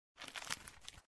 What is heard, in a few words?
blow